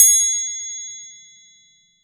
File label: triangle; TRIANGLE2